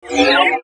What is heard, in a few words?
Mouseover Digital Warp Warble; Multimedia; Blastwave